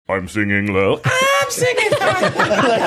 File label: i'm singing low i'm singing high